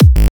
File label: Kick Bass G Hard